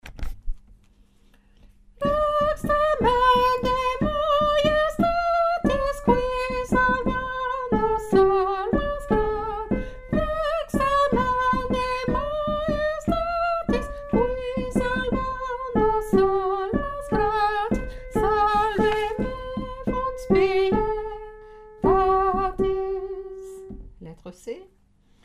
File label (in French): lettre ABCDEF tenor, chorale